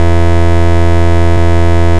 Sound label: Grenade Beep; Milkchoco; Android